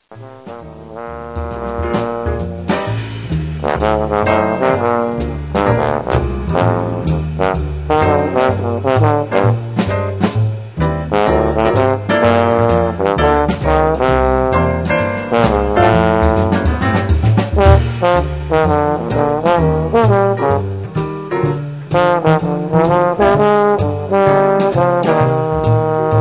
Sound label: bass trombone; Jazz Conception for Bass Trombone